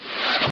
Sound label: woosh; WILLIAMS; INDIANA JONES; Indiana Jones Original